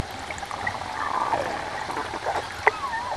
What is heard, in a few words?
Oncorhynchus kisutch Gill Bubble Air Movment Pulse Whistle